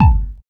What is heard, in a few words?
BD; E-Lab; X Static Goldmine 1